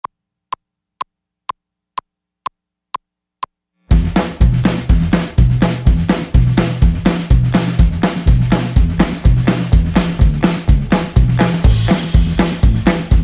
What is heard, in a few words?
Country Train